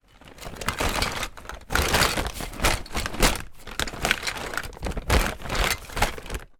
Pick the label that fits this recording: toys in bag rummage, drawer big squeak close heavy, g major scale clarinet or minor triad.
toys in bag rummage